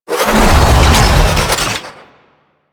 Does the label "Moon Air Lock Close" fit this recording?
Yes